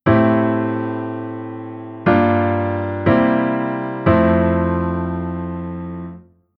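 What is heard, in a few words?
Chord Secondary Dominant sus4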